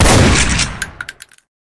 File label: shotgun fire, weapons